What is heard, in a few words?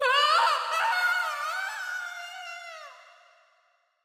Prolonged screaming; Widowmaker; overwatch